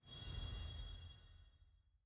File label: purchase button hover; lol